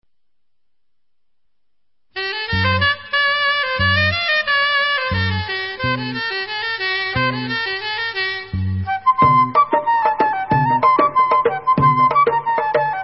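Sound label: Instrumental, Israeli Dances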